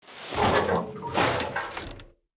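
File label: Weapon incinerator jam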